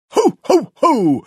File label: ho ho ho